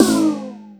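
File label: snare processed, Disco House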